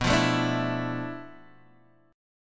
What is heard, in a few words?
D Minor 6th Add 9th B